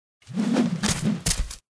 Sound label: Spawn SFX; Lust Princess